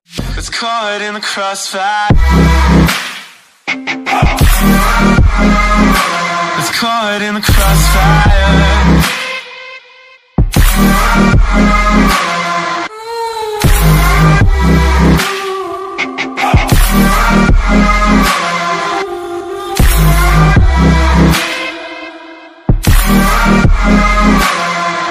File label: Song Ringtone